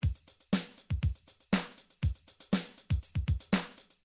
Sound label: midi drums